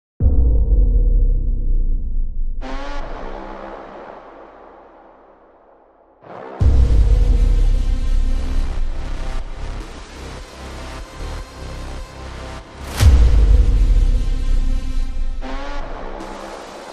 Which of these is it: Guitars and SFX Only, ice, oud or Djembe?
Guitars and SFX Only